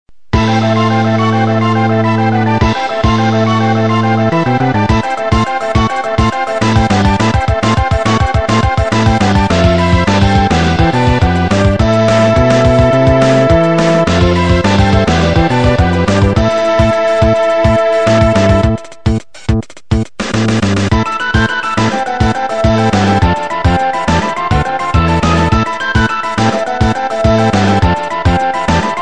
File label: Boss Fight